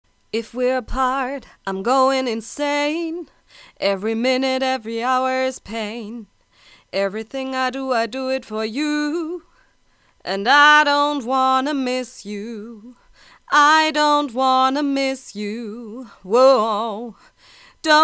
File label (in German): t.bone sc450 usb gesang